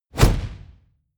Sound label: push